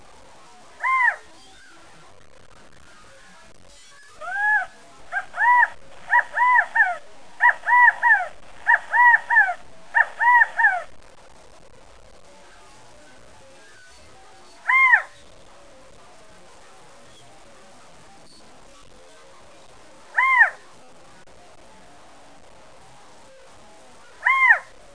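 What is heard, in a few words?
California quail; The Ultimate Birder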